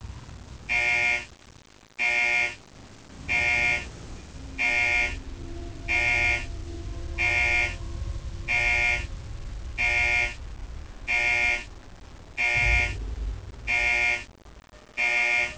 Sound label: siren